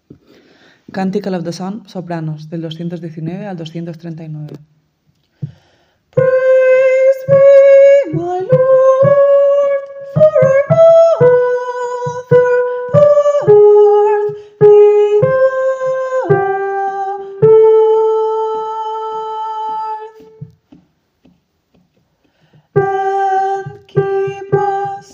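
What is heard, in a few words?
soprano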